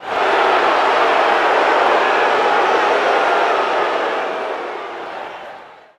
football crowd boo; Crowds

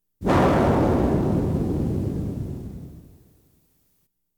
Science Fiction effects, Lasers, Light beams

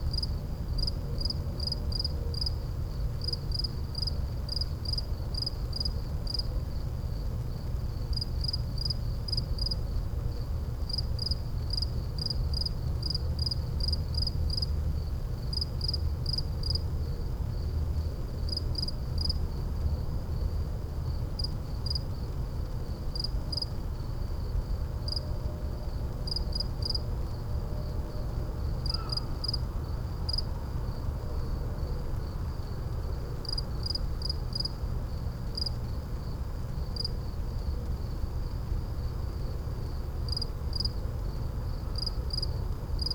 Urban night electricity cricket; urban and suburban; boom library

